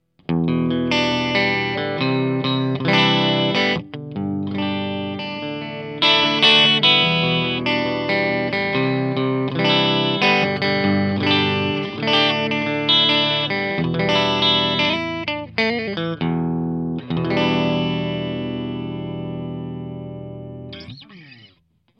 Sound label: 20w Clean